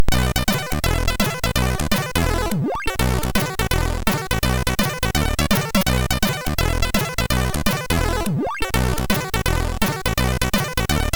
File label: MOS 6581R3; sid